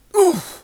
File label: pain